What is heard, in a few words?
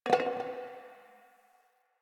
Garbage Metal Can; Warframe; Ambience; Nineteen Ninety Nine; DE